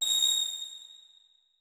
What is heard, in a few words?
notes, keyboard; Hideout Furniture